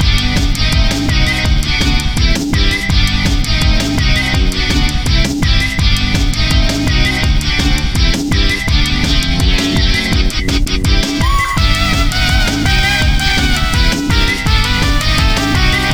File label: battle loop; ReisenYoumuOuting